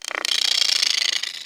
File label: Lizard Hiss